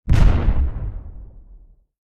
Underwater Explosion

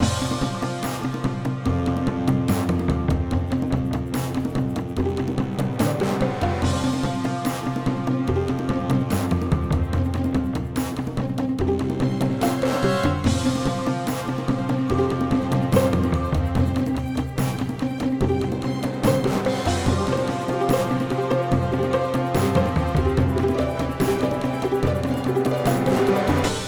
Battle Music (You Lose); Pet Arena; monkey quest; macos; windows

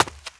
run concrete; lucius

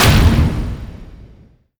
Boss Attack; Pac-Man Championship Edition 2 Plus

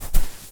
footstep sand run

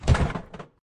Drop Heavy Box; Lethal Company

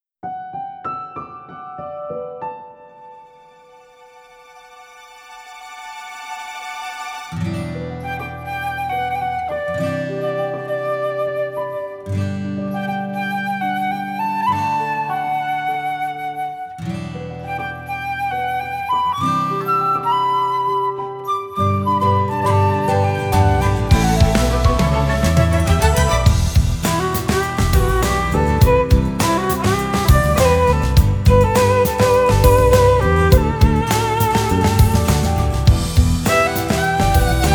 Instrumental; Schwarzesmarken

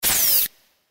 SFX Injection Whiz

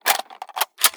SNIPER RELOAD

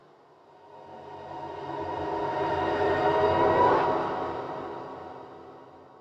echoes; thief 2014 gamerip

